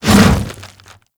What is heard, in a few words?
Infested Charger Leap